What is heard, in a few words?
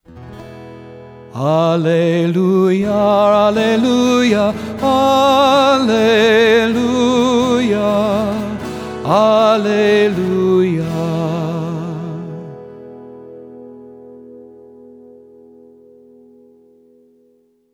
Alleluia without verse